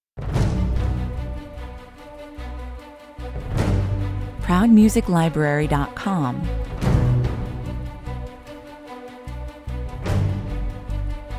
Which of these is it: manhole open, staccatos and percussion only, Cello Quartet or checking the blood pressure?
staccatos and percussion only